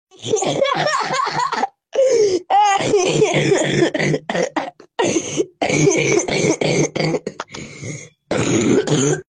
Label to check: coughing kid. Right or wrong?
Right